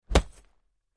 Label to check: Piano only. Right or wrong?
Wrong